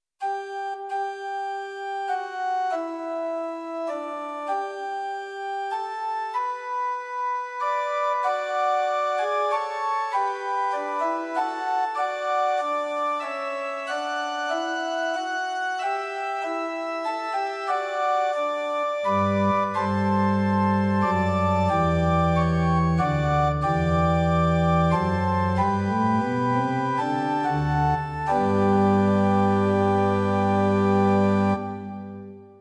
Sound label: Short Organ Music Pitch 440 Temperament Werckmeister; Werckmeister 1-3; Prinz 8 4; hymn